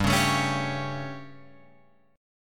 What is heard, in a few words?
G Suspended 2nd Flat 5th G Guitar Open F; Gsus2b5 strummed; JGuitar